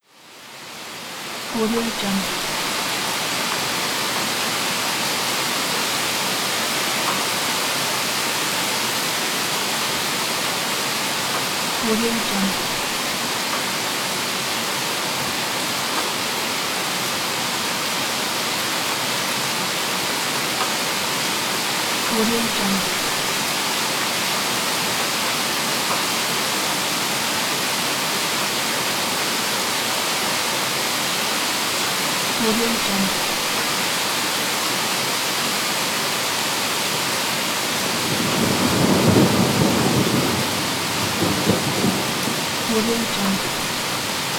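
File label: rain outside the window with thunder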